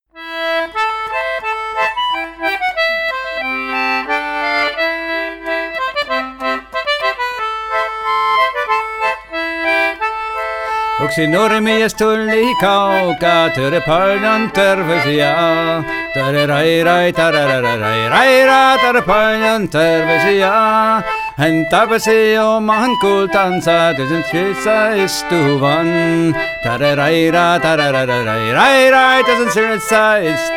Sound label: Concertina Anglo Wheatstone Linota; Nuruokalinen; Staalkaart